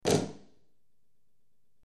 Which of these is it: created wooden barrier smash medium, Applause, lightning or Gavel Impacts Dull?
Gavel Impacts Dull